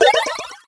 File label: portal; alien rush